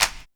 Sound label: clap, hip; Best Service; Dance Mega Drums